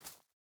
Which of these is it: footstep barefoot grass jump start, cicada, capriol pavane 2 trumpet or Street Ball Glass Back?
footstep barefoot grass jump start